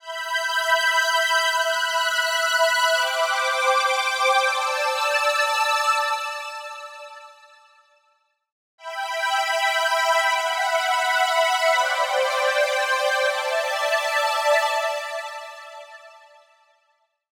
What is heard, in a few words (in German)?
Strings Umkehrung und Grundstellung